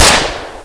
gatling fire, weapons

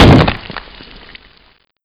body splat

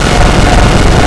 chainsaw screech

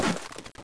grenade throw

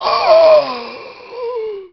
zombie die, male

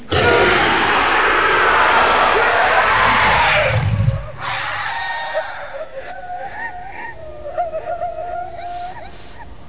far scream

